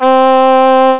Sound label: Oboe; HyperComposer